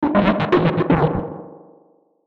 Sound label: Musical Warble, Musical Warble Descend; Warbles; Blastwave